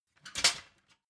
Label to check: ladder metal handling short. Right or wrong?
Right